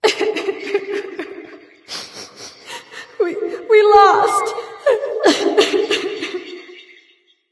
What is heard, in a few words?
boohoo light; smite; voicepack; hel